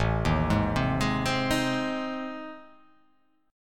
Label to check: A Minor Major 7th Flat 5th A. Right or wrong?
Right